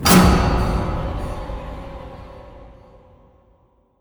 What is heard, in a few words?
Jump Sound